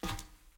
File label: footstep metal; Ben 10 - Omnicode